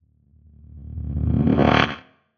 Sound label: Magnetic, Whooshes